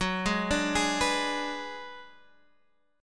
Ab Minor 7th Flat 5th Gb

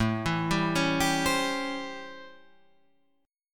A 7th Sharp 9th A, Guitar Half Step Down

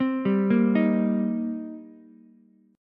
C Minor 7th C Ukulele; Cm7 arpeggiated; JGuitar

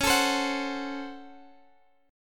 C sharp Major 7th Suspended 4th Sharp 5th C sharp; strummed; x,x,11,14,13,14

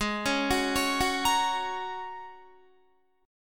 Ab 9th Suspended 4th Ab down Guitar; Ab9sus4 arpeggiated; JGuitar

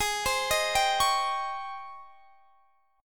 G sharp Major 11th; x,23,22,20,20,21; JGuitar

